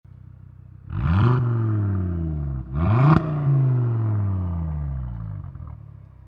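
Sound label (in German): AGA offen Gasstoß, Abgasanlage Audi RS 3 Limousine 8Y; stoll sport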